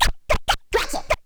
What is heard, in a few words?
Scratch